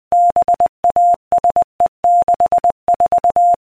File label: morse